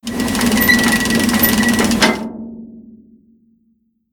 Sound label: Harvester Ladder Down